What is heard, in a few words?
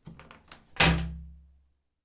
Toilet Seat Cover Down